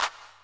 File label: clap